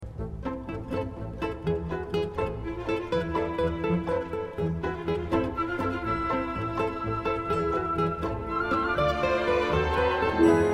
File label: Flamenco